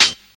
studio hihat C# key, Royality free pedal hi-hat sound tuned to the C# note. Loudest frequency: 3957Hz; C sharp